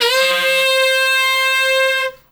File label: SAX, W.AFR.SAX; Spectrasonics; Heart of Africa